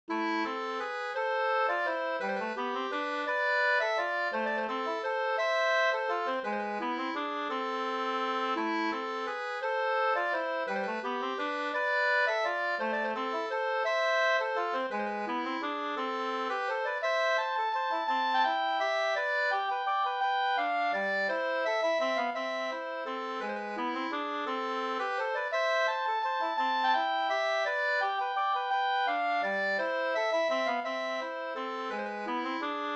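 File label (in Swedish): vals, two-part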